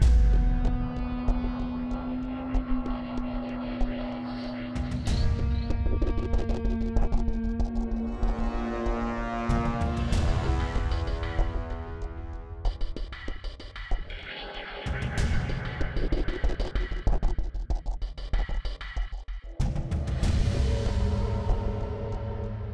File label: Exploring Melody Loop